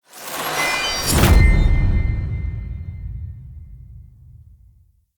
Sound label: UI XP Gain Level Up; Hud UI